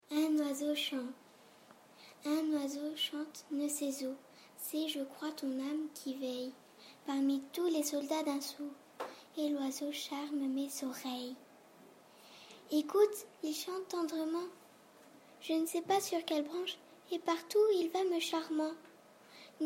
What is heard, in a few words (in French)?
un oiseau chante